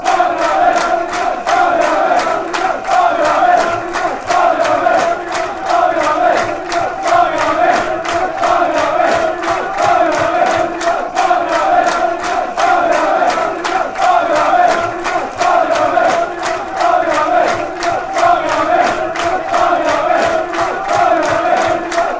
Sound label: barmy army morcambe, chants